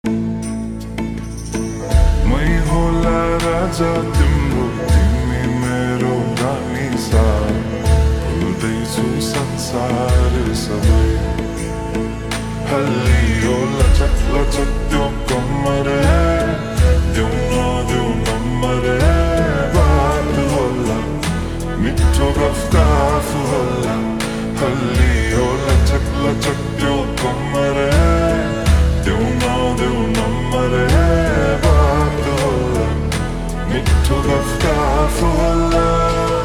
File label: Slowed Reverb